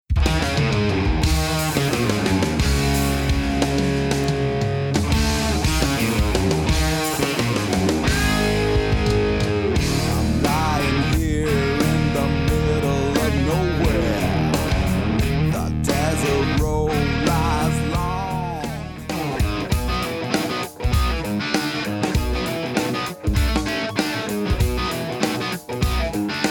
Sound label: Rock